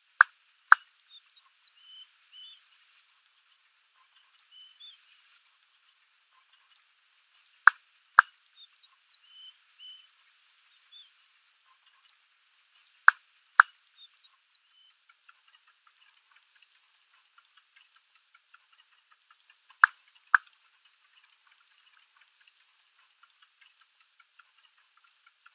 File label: Arabian Bustard male display